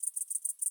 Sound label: Grasshopper-Chirp